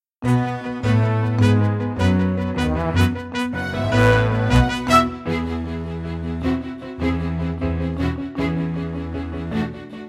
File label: Instrumental